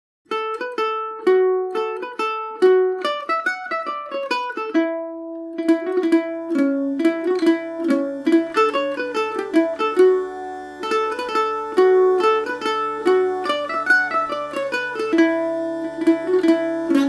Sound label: D maj paris swing overdubbed plus drone, mandolin; “double-tracked” Paris Swing Macaferri mandolin plus Uilleann pipe drone; The Golden Hour